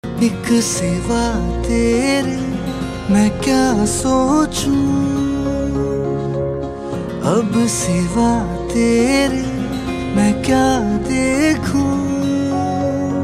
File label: love song ringtone